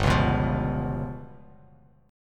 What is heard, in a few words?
G 7th Flat 5th down Bass Standard; strummed; JGuitar